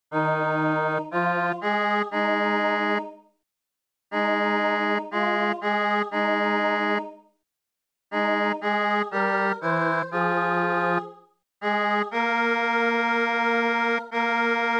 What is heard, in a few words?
bas